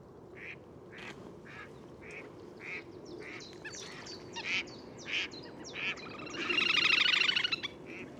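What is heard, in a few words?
mallard anas platyrhynchos; kryakva; utok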